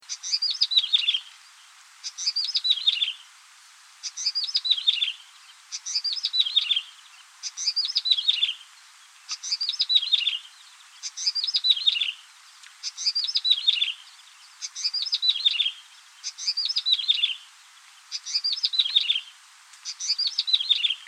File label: yellow bellied prinia